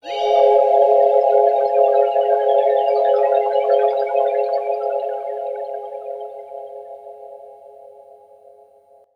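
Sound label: deep house FX, 125 bpm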